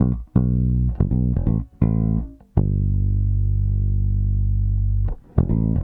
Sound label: Soul Bass, 70s Analog Funk; Sonic Foundry (Sony Creative Software); What it Is!